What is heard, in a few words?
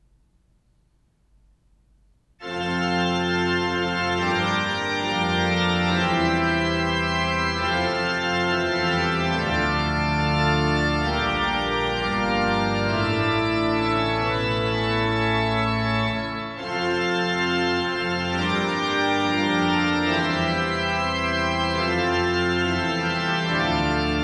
Fanfare; st petri svg